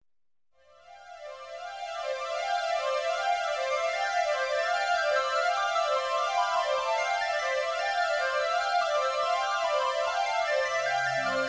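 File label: synthesizer melody "running string" medium tempo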